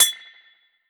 Cowbell Cheap; One Shots; Drums; Ableton Live 12 Lite; Core Library

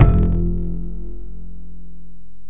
Instrument Finger Off; Ableton Live 12 Lite; Vector; Sprites

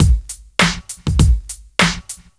GROOVE SPECTRUM; Sony; ACID Pro 6.0; Startup Project

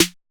Snare 808 Slam

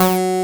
Infected Bass - F#3, Synths; Multisamples; Ableton Live 12 Lite; Core Library

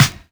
Snare Vinyl, drums one shots; Ableton Live 12 Lite; Core Library

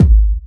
Kick; Ableton Live 12 Lite Core Library

